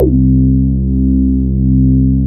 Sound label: RESO C1, BASSES; Best Service Alpha Dance vol.2